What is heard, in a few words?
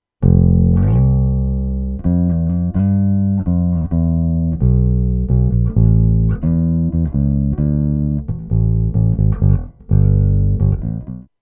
ACOUSTIC